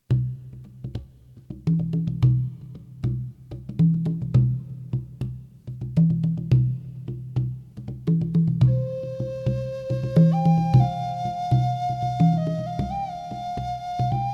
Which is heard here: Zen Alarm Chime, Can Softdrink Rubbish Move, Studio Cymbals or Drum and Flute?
Drum and Flute